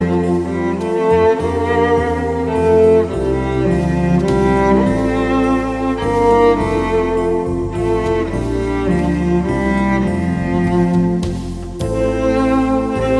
Cello

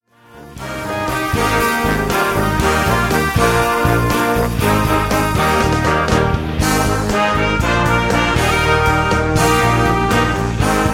Brass

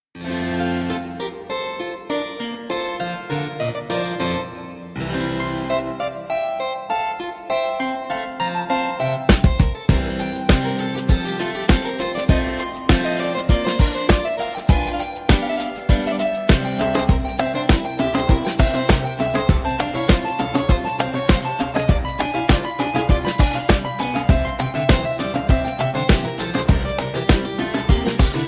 Concerto; Italienisches Konzert, BWV 971

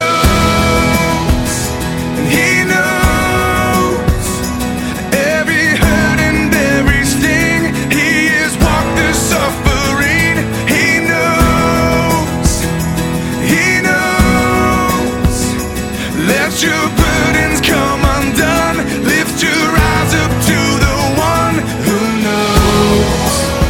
acoustic

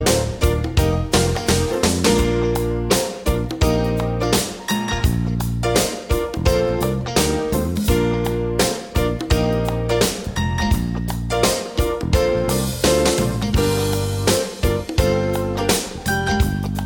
Happy Groove

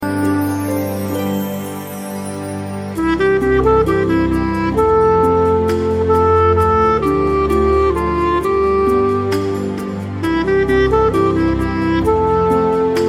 instrumental

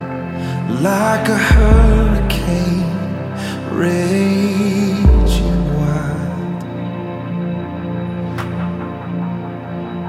Heartbeat Live

